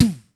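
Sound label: M627 snare, drum; Crypton - DrumBase5000; MPC DRUMS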